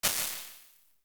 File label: Vocal Room, Ambience and Small Rooms; Ableton; Convolution Reverb